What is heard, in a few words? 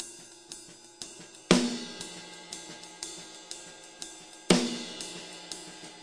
six eight Loop, Six-Eight Loops 100 to 105 bpm, DRUM LOOPS and BREAKS